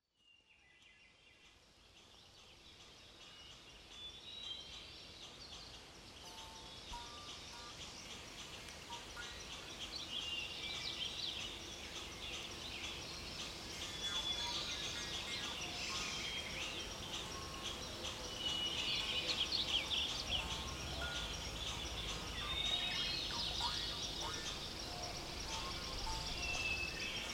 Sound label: Harp Birds In The Woods